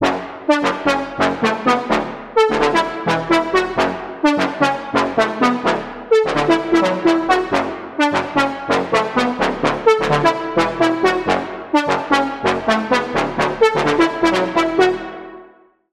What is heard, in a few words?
Lead, Sylenth1; Routed Sound; Sylenth1 Expansion - Noble Gangster Vol.1